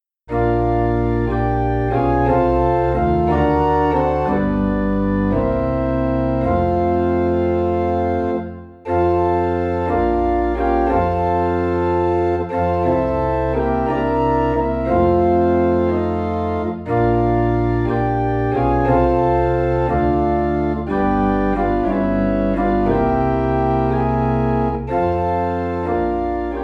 Organ in F

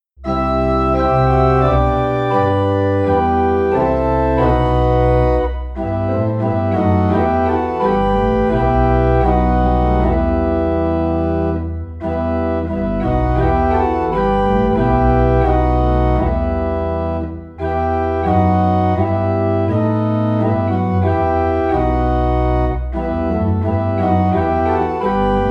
Organ in E Minor; Jesus Lover of my Soul

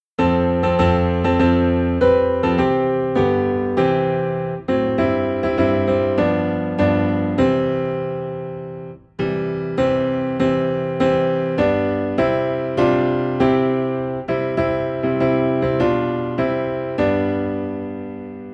Piano Low in C; A Shelter in the Time of Storm

